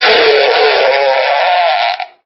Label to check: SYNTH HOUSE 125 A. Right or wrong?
Wrong